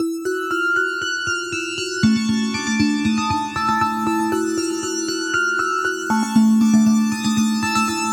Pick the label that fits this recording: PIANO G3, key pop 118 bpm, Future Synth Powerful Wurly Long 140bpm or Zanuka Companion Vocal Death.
key pop 118 bpm